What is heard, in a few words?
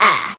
Ahh; Beat Saber Miss Sounds